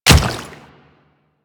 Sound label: Water Step, Footsteps; Royal Guard